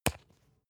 spice jar smack open slow; industrie wirtschaft; gastronomie

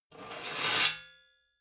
metal sheet scrape; metall

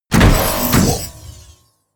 sci-fi click negative heavy; hits impacts

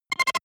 button, signale; soundpool

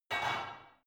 barbell only replace reverberant

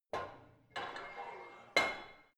barbell heavy plate put on; sport freizeit; fitnessstudio

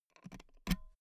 pull out euro plug hard; objekte; elektronik

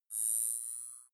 zoom in long

